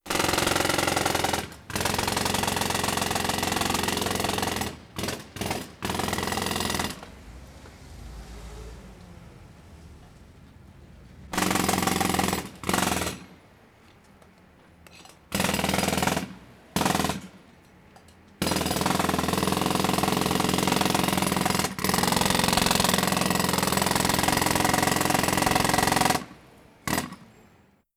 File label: Pneumatic drill